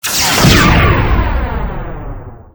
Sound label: gun